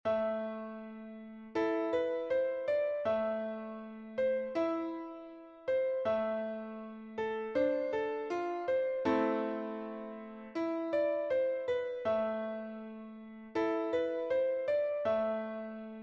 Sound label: piano